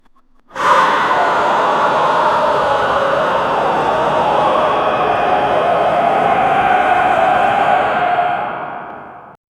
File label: running crowd noise; apocalypse